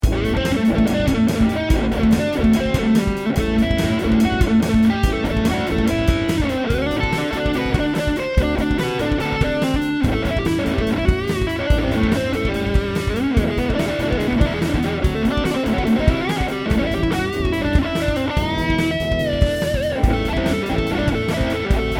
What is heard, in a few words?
solo